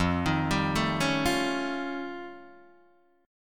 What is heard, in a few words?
F Augmented 9th F Guitar Open D, chord